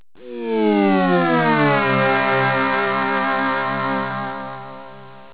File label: logout; xfce